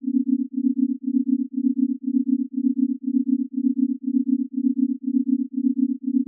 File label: crickets